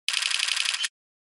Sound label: Camera Phone